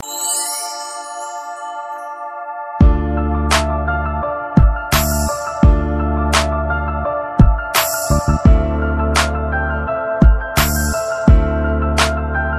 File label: beat